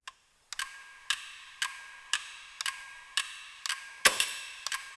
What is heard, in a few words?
clock ticking loudly